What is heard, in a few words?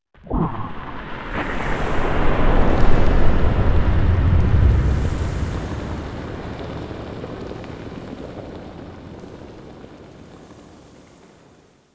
Bubbles & Steam; moul-assets